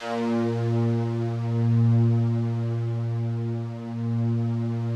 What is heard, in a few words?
FOG ATTACK, Yamaha VP-1; Music Store